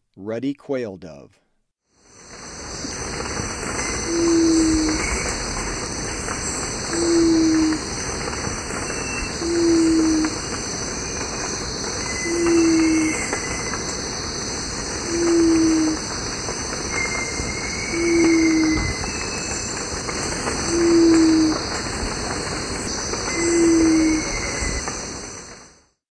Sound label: Ruddy Quail-Dove; S. America; Eastern Lowlands